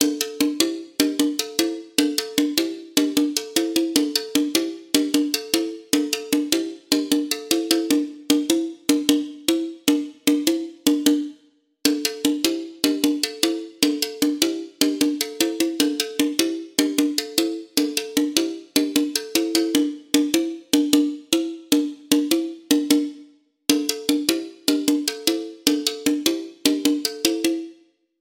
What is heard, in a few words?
Percussions